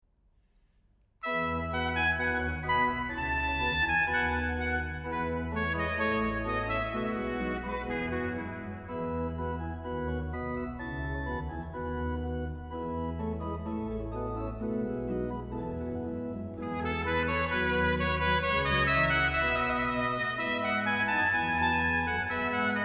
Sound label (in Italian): Suite in E major Gavotte, organo e tromba; Sonate per organo e tromba; 'Indissolubilis Amicitia'; Muffat